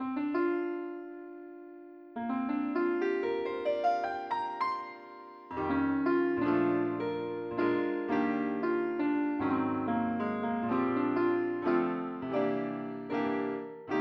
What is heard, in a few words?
piano